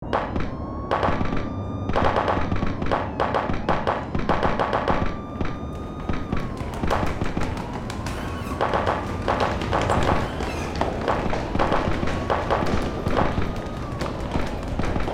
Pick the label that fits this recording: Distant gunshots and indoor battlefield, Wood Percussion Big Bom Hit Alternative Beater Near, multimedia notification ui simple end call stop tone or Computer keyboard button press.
Distant gunshots and indoor battlefield